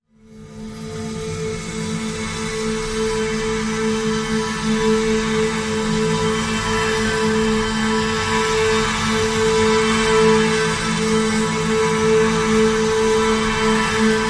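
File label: Drn A, drone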